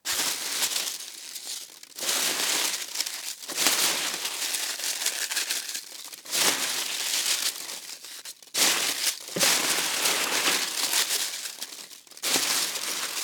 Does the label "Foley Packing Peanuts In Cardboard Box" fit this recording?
Yes